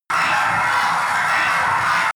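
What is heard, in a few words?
screech scrape reverb, Science Fiction; sciene-fiction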